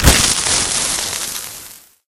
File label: impact flare; video games; source engine